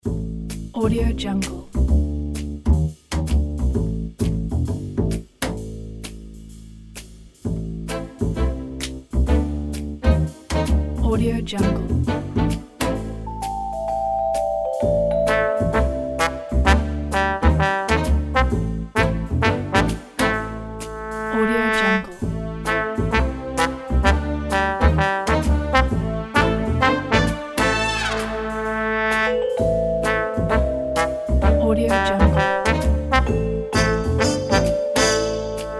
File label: detective swing theme